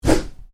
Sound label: Swish: Short, Slow, Whoosh; Whooshes (Sound Effects:Sound Design); Stuart Duffield